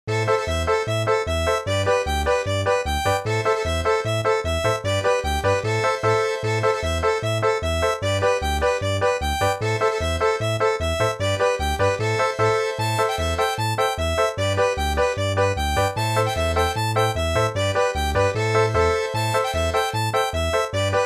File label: easy polka